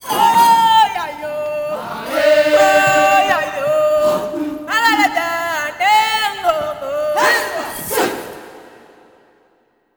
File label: KUZIMA CALL; Spectrasonics; Heart of Africa 2; MADZI KUZ